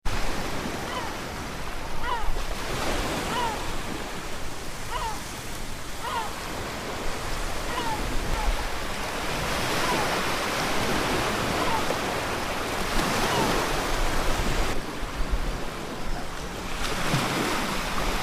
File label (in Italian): gabbiano e mare; acqua